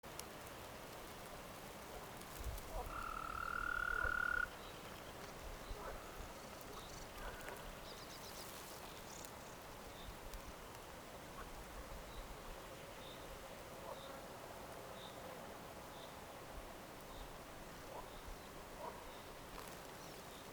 green toad bufotes viridis; lyagushek i zhab

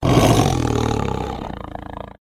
tigers short roar; tigra

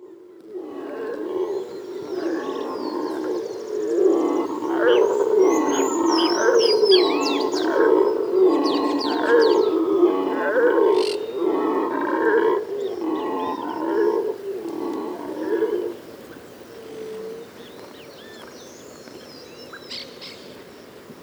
black and gold howler alouatta caraya; revuna obezyany

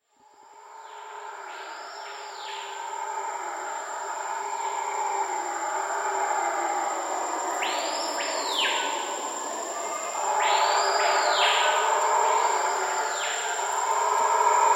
guianan red howler alouatta macconnelli; revuna obezyany

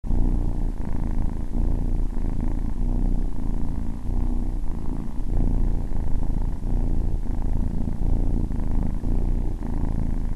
kitten purr loop; zvuky kak myaukaet kotenok

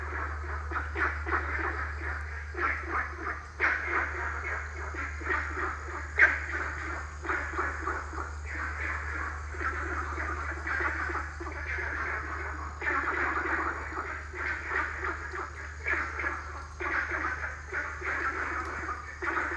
guenthers frog hylarana guentheri; lyagushek i zhab

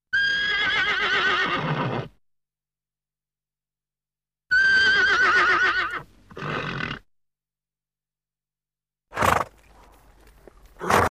snoring and roaring; rzhaniya loshadi i konya

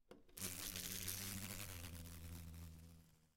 hornet wing buzz; shershnya

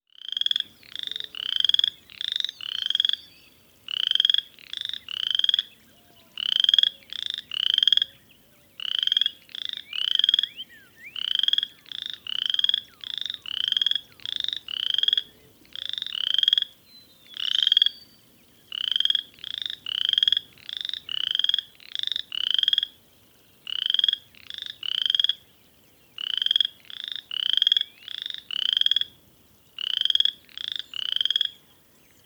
boreal chorus frog Pseudacris maculata; lyagushek i zhab

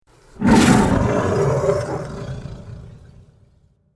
tiger shows discontent; tigra

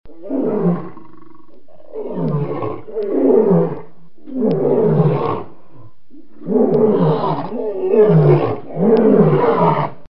lion roar; rychaniya lva

